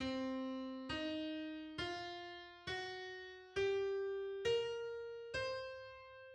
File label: Blues scale hexatonic C; MIDI